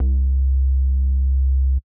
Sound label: Sub C1; Zero-G Sound Sense Grime Scene; GS Construction Kits; The Hoodrat